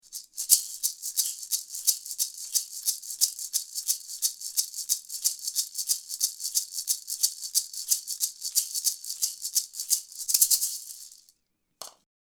Maracas

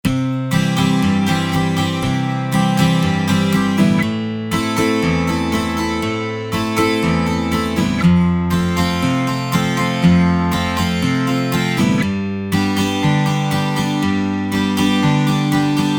12 open string

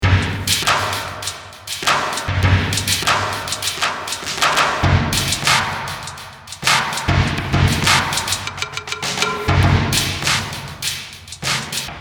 special tribal

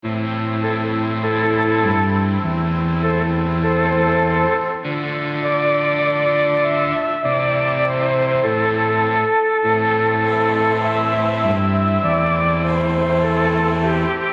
Mellotron

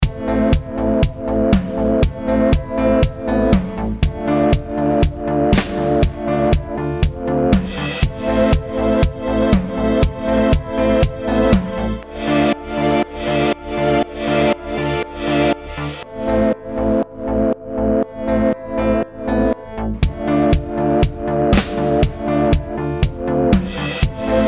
song sidechain

